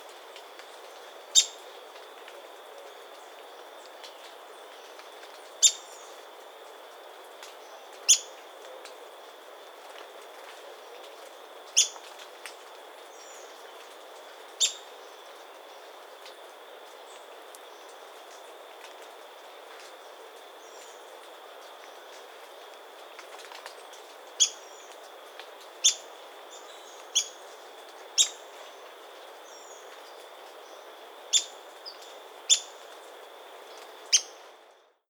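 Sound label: Montane foliage gleaner, bird; برگچین کوهی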